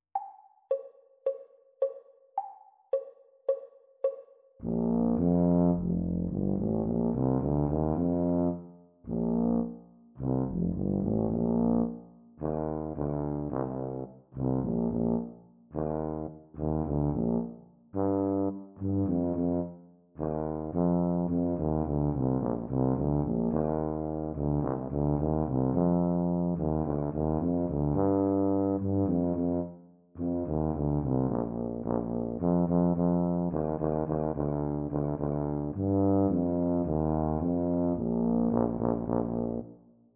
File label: Bb Tuba Duet; exam speed; Grade 4